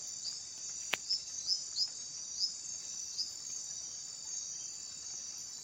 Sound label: Vitreorana ritae